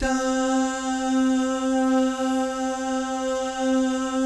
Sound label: DAH, Vocal Jazz; ILIO